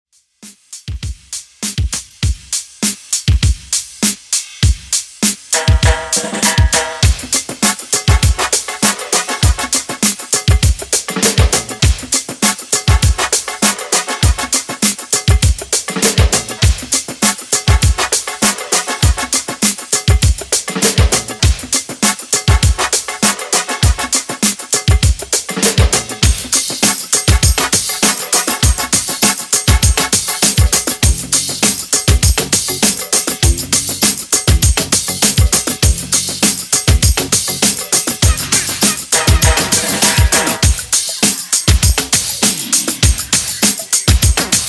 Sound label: Classic Redrum, 100 Bpm